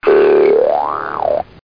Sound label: VIBRATE